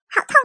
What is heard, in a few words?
Hurt, 人聲